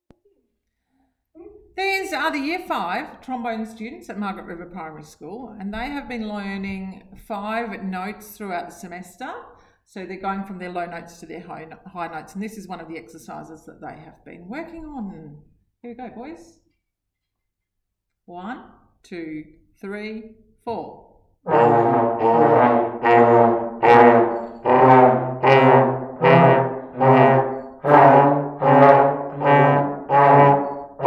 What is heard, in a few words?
Trombone 5 notes; Year 5